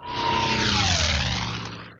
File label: plane flies